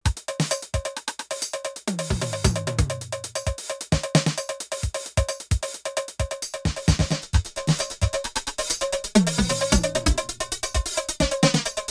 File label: Flanging